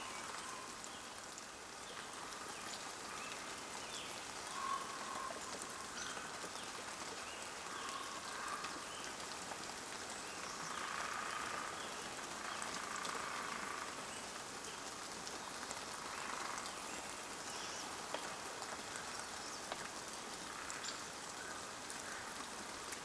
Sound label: ambience coniferous forest day calm drizzle; Rust